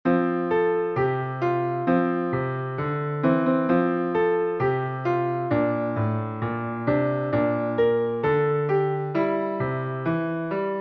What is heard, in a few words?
jingle bells; pierpont james